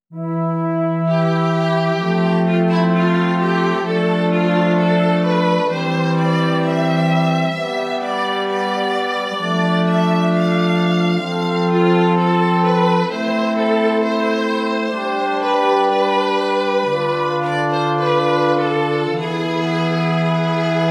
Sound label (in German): Stimmiger Contrapunkt; 5 Gattung; Ronge; corretto